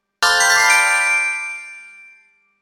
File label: achievement unlocked sound effect